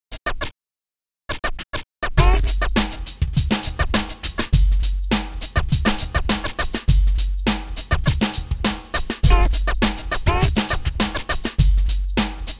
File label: scratchhop